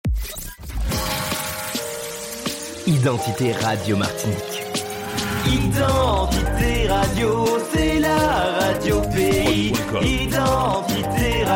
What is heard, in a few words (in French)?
Jingle Chante Tropical; Identite Martinique